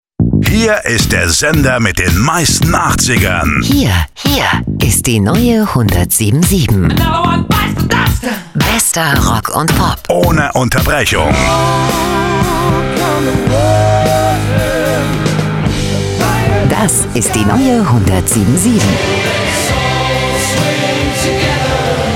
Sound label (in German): Hookpromo Rock